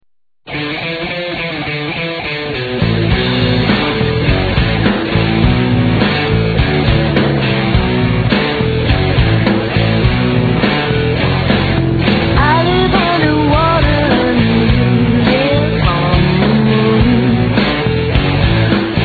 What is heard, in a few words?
breathe